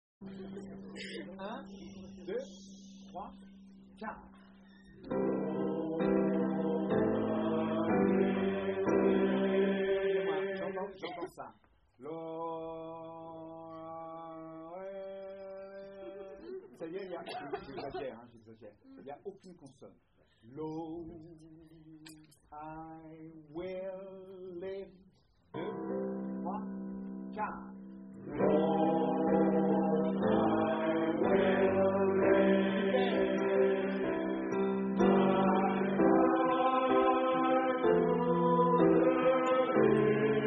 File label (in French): hommes